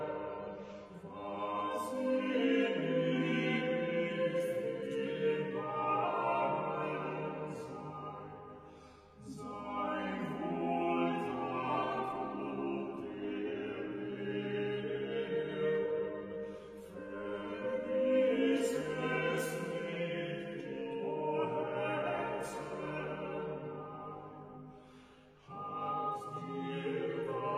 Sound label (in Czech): D-dur